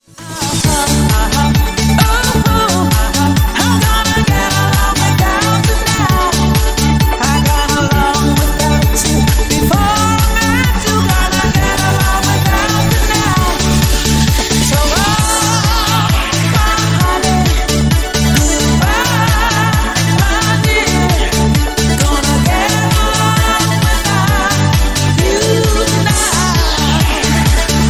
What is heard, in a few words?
VIOLA